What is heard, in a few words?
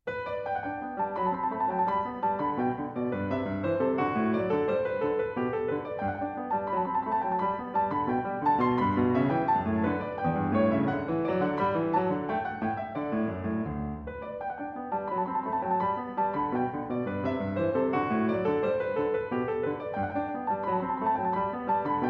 French Suite in G, Bourrée; French Suite No.5 in G, BWV 816; Vladimir Ashkenazy; Bach French Suites, BWV 812-817